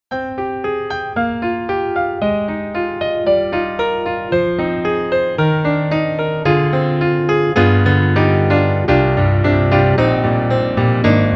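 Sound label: LA Custom C7 Indie, piano; Spectrasonics; Keyscape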